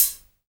HH, Dance Mega Drums; Best Service; HH HIP

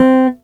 CHAPSOLO, CHAPMANSTICK; Greytsounds

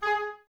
OBS G#4, Oboes; Miroslav Vitous - Woodwind Brass Ensemble

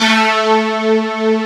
FILM PPG, POP BRASS; AKAI S6000 CD-ROM - Volume 1; BrassWind

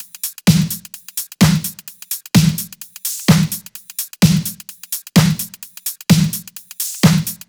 Loops 128BPM, Drumloops

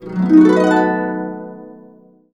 B, Harps; USB Soundscan vol.62; AKAI

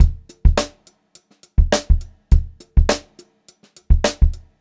Funk Groove Drumloops; USB Soundscan vol.38; AKAI

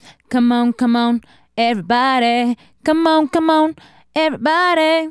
Female Solo, Hip-Hop and RnB Vocals

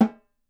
SN, Percussion

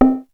SYN PLUK; Rubber Chicken Software Sample Bank

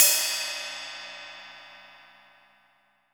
16 RIDE CUP

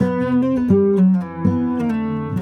Sarangi, Acoustic String

